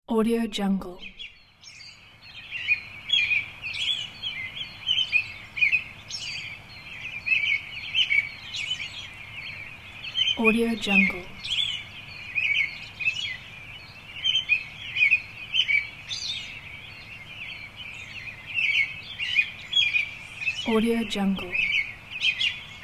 birds chirp

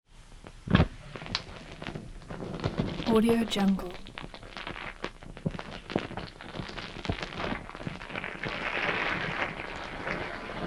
ripping fire electrical discharge bursts

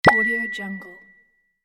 pop ping

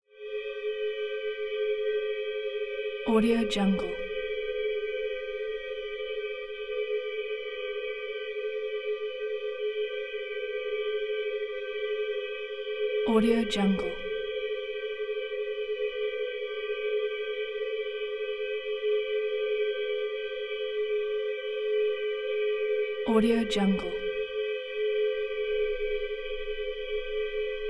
crystal synth pad constant ringing shimmer

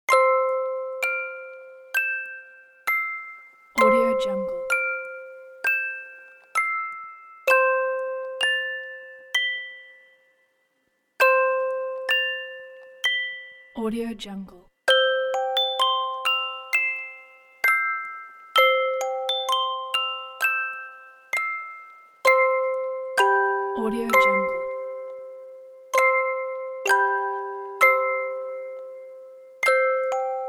Frere Jacques music box lullaby

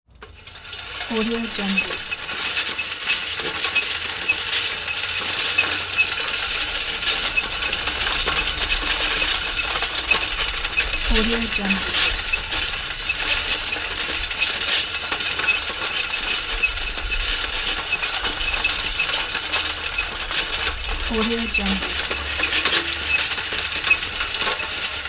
manual push lawn mower

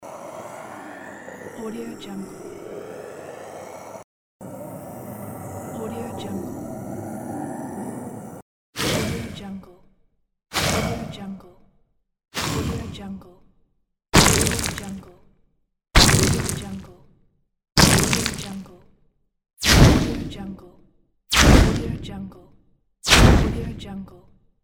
wizards attack

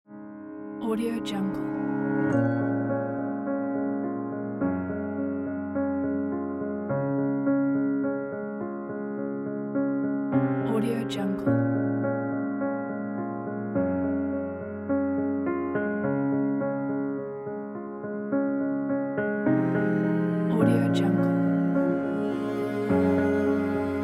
romantic

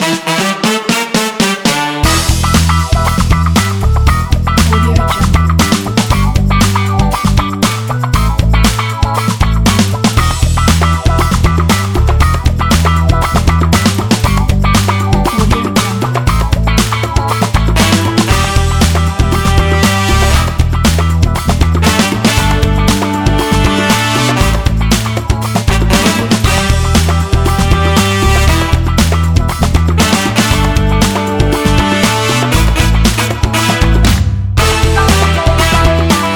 funk

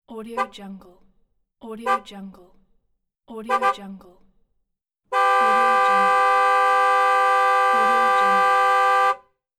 car horn

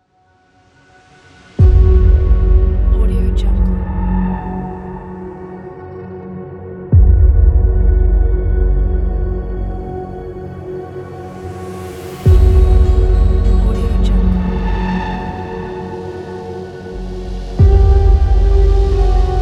cyberpunk game trailer

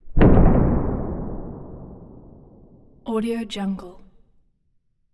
explosion mid woody

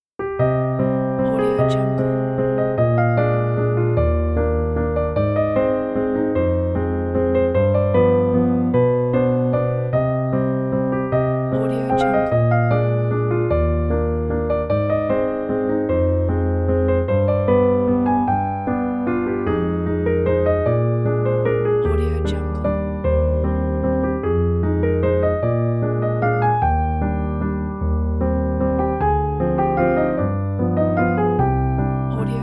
melancholic waltz

